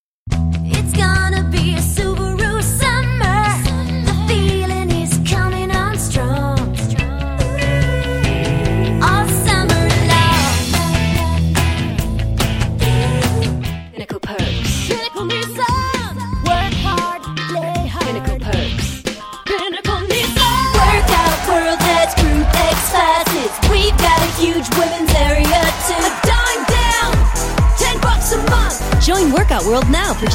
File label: Jingle Singing